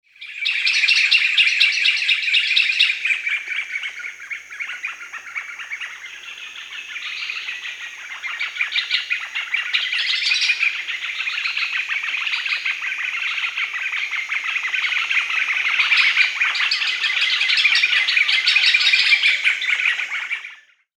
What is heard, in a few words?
White-fronted Nunbird (Monasa morphoeus), Peru; S. America